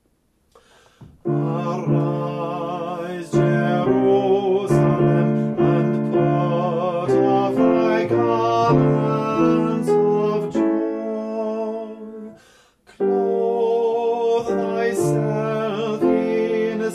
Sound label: soprano